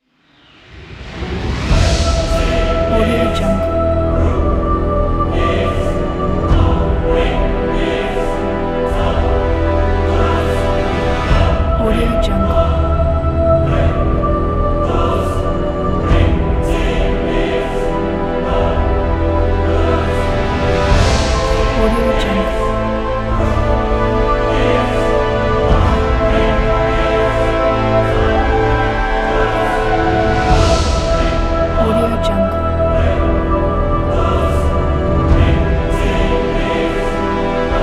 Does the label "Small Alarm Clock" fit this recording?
No